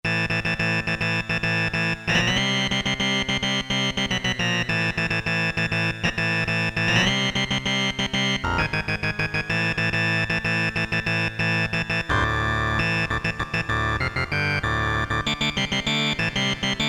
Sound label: METAL GUITAR, Casio Rapman; Tones